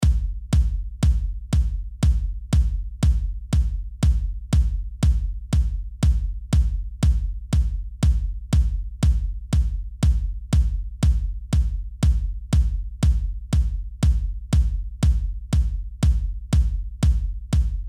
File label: kickdrum 120bpm